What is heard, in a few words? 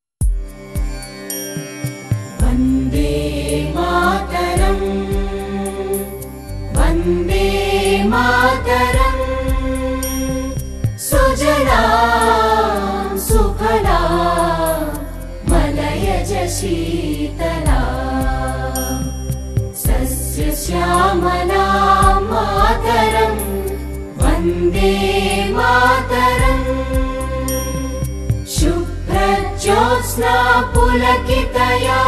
Vande Mataram National Song Of india Patriotic Song